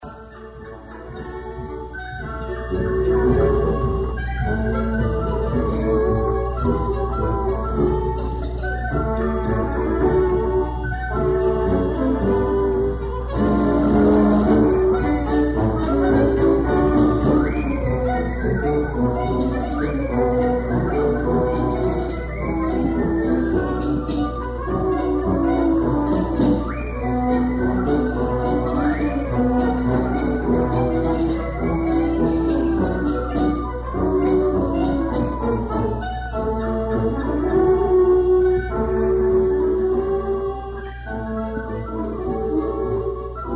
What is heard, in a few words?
ocarina; Ralph Peer